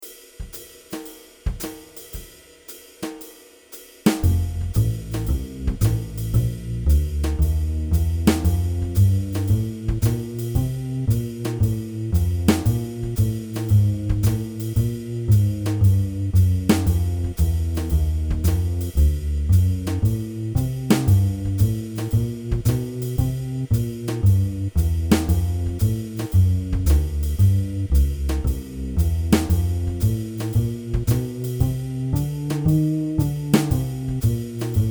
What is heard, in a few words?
blues solos no comping, for piano or vibes; to add comping part